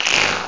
hah, voices; The Epic Collection 3; Epic Marketing; muzak samples

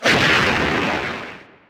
death; stream

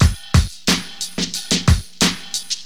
Drum loop, HipHop; LiveAct Pool 1

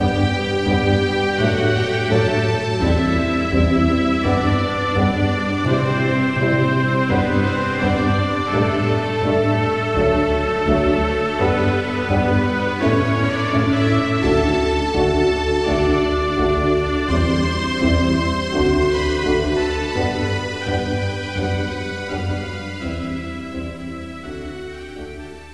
pomp

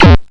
HIT POINT; RpgMaker

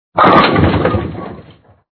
hard Hit; tom jerry bowling

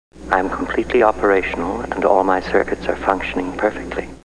boot

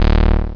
buzz low; yoda

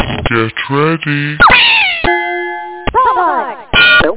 door cr; quiz

